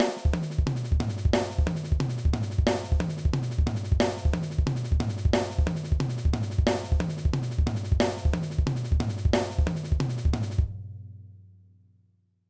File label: Chop